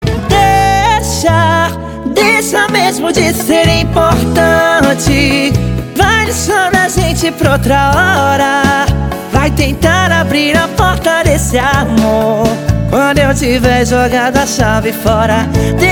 ringtone

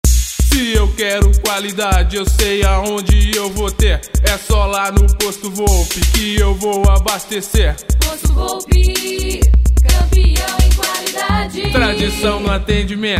funk

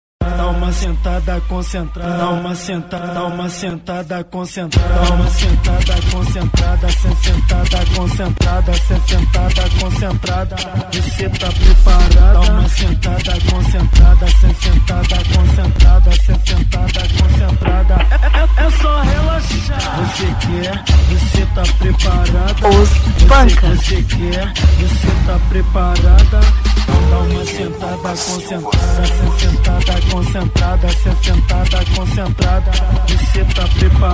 funk session

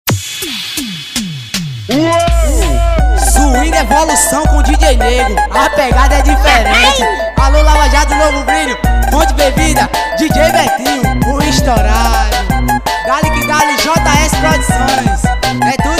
swing

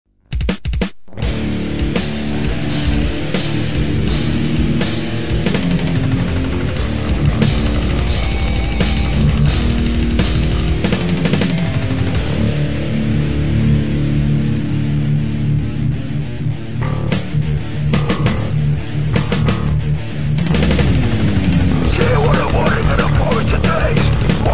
heavy metal

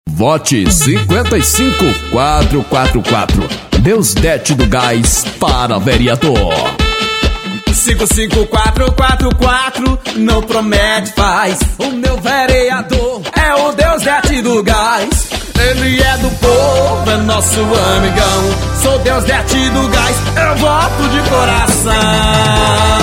jingle; arocha

